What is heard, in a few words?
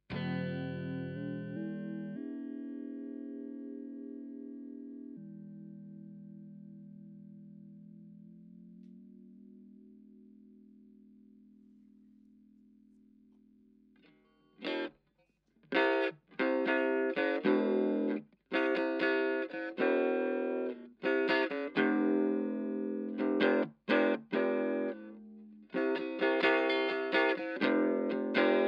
frequency shifter